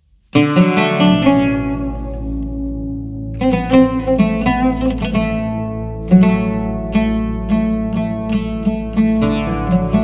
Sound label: rast taksim on oud